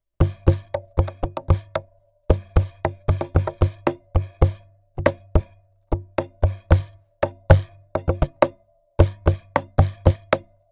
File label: cajon pickup sample